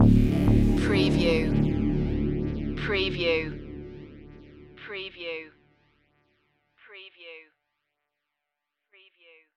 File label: dark hour loop end